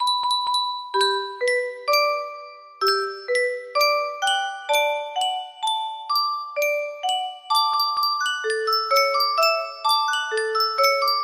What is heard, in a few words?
yunsheng music box, music box melody